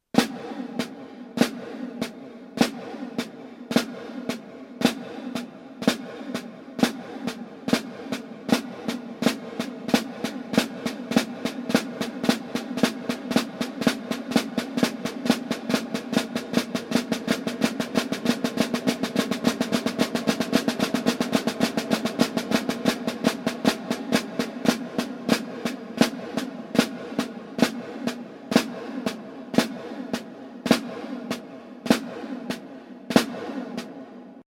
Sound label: flam tap